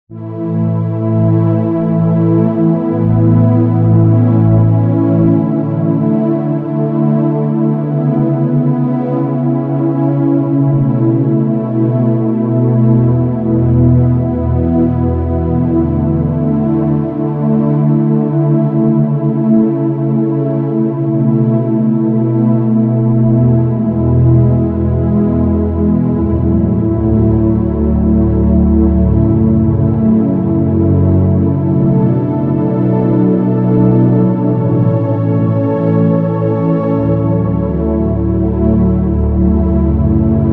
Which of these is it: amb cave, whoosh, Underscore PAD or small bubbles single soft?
Underscore PAD